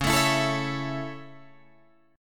Db Minor Sharp 5th Db Guitar Open G

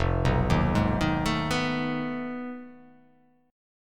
F 7th Sharp 9th Flat 5th F down; F7#9b5 arpeggiated

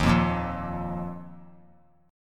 D sharp Suspended 2nd; strummed; JGuitar

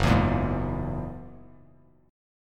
F sharp 9th F sharp Bass Standard; F#9 strummed

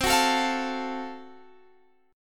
Gb Minor Major 7th Flat 5th, chord

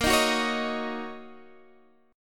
D sharp Minor 7th

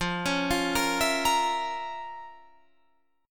F Major 7th Suspended 4th Sharp 5th Guitar Drop D; 15,16,15,15,17,18; down; chord shape

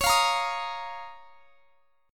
Db 6th Add 9th Db down; Db6add9; strummed

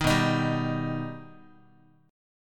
B Augmented B Guitar, chord; strummed; JGuitar

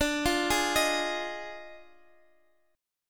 Eb Major 7th Suspended 2nd Suspended 4th D

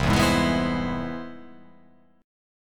C Augmented Major 7th

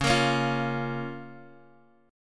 Db 6th Db, down, Guitar DADGAD

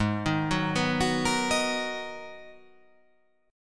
Ab Minor 9th down; arpeggiated; JGuitar

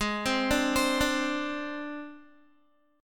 G sharp Major Flat 5th, Guitar; G#Mb5 arpeggiated; JGuitar

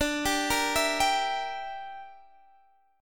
A sharp 6th Flat 5th

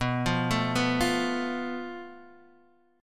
F Major Flat 5th B

